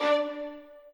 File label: strings